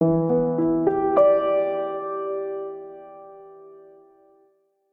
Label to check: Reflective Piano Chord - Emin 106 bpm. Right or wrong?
Right